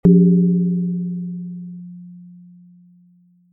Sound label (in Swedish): trumma